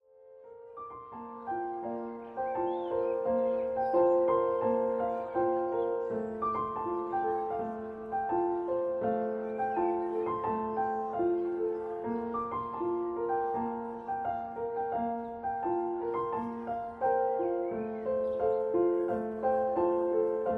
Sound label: piano diary